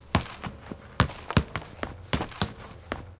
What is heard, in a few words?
Soccer dribbling grass